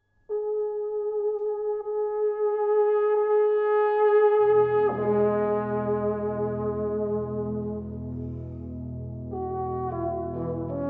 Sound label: euph cad